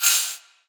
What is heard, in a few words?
gambler mouseover; DraculasCastleWD; wazdan; gambling